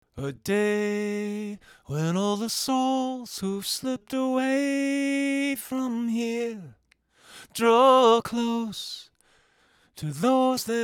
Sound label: pitch up 1 third; retune